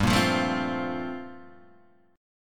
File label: G Minor 11th down Guitar Standard; Gm11 strummed; JGuitar